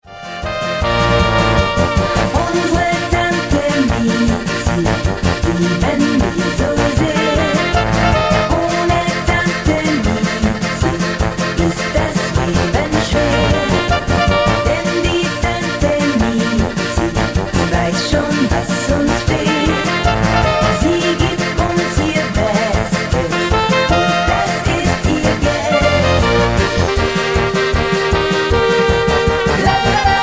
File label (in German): mit Chor